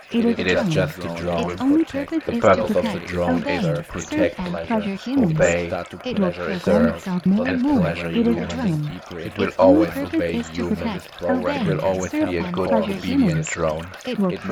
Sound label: Drone loop midi; Drone Loop 2 medium; hypnosis; drone training